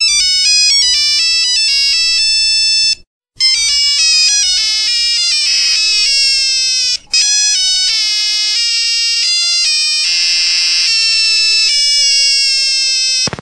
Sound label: broken nokia ringtone